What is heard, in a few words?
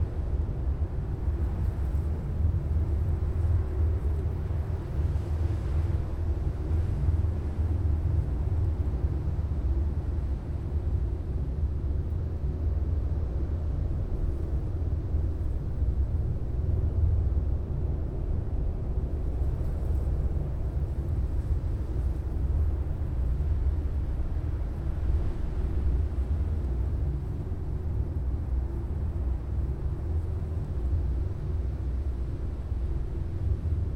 Sand Blasted Cave, Background; Deep Rock Galactic Boardgame